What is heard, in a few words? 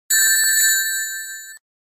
Ring loss SFX; Sonic The Hedgehog